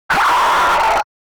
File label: Alien Screech Scream - Monster Dinosaur; Hollywood Edge; sound designer's toolkit v2